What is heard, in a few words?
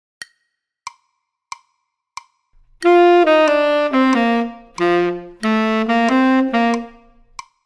Tenor Class Example G, Saxophone; Example 21g; Recordings For Beginning Jazz Saxophone Studies for Tenor, Volume 2